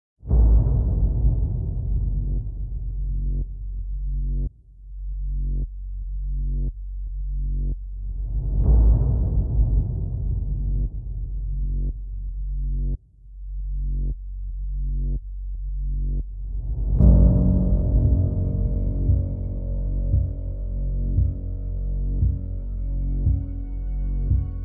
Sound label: Counting Waves No Lead